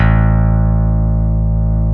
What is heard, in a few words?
CP70 D3, YAMA.CP70; East West; Global Sounds